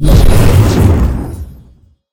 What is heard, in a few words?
near win reel trigger; silverback